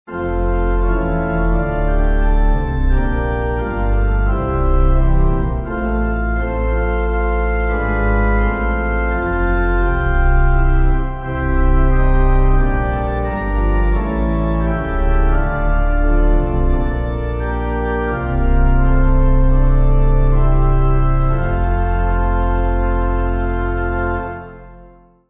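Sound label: Pipe, Pipe Organ backing; At This Table; Love Divine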